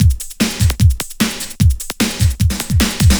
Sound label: LOOP, Eurotech; Sounds Good